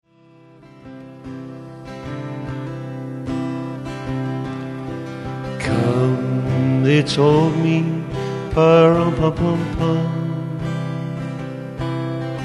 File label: Drummer